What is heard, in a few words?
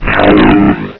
shield; quake; fortress; artifact